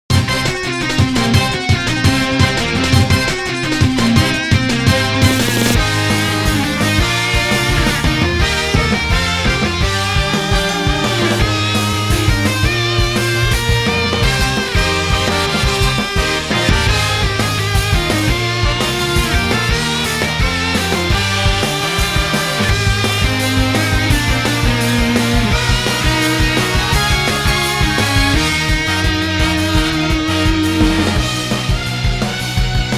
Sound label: normal Battle